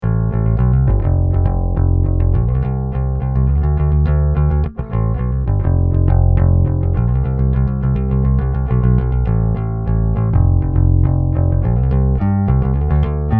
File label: P Bass Pick Line Speaker